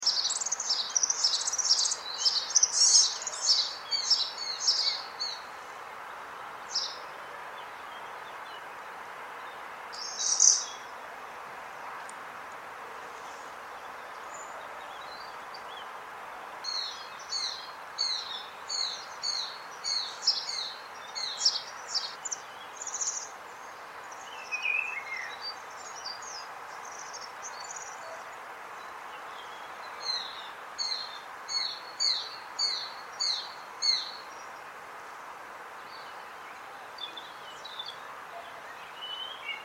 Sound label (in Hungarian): karvaly hangja